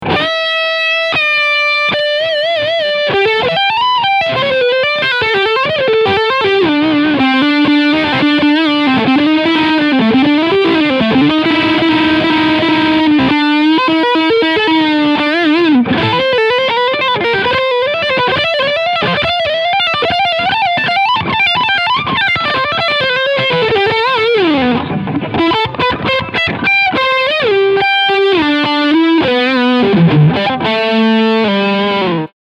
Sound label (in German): Leadsound Steg HB